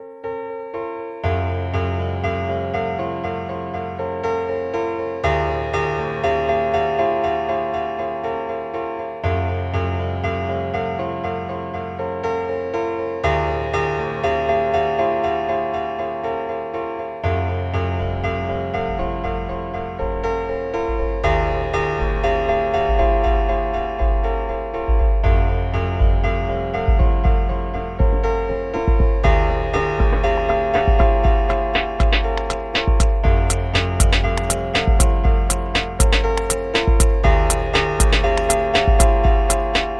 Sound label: beat